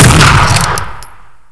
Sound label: sniper rifle; unreal tournament 2004; fav UT sounds